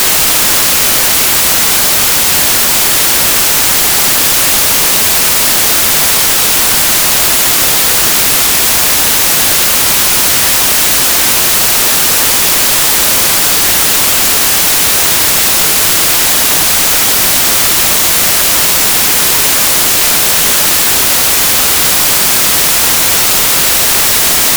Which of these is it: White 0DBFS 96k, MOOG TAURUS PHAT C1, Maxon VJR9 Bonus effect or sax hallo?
White 0DBFS 96k